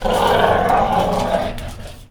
DOG; 3D Sound - 3D Keys VOL-1; MEAN 3D

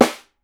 SN, Percussion; Miroslav Vitous - Percussion & Harp

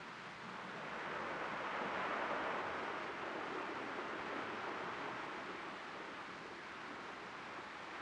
Beach Light, Water

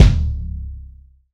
ROCK CKIK, ROCK KICK

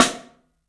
MARCH, 3D Drums; 3D Sound; MARCH XTREM

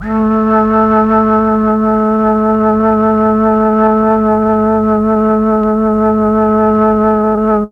flute; ILIO; Propeller Island Legendary M400

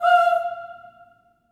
Staccato M F5 L, Symphony of Voices; Spectrasonics

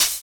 Open Hhat, Hihat; Equipped Music; Loungin House

